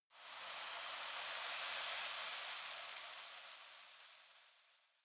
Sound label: desert oneshot sand